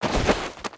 Roll Left

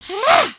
ninja build forward, emotes

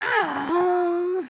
sigh; emotes

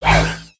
Swing; Valiant; Sorcerer; aronwk collection; ndaudio

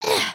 stink; emotes

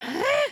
raincloud

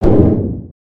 Siege Tower Distant; destructibles

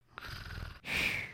Sleep; creatures organic

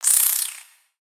Spider Idle; creatures mechanical; Lego

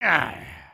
stun

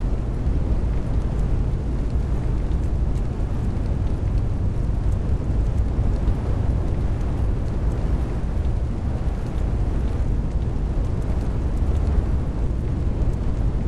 Amb Dojo Fire, ambience; Ninjago 2D

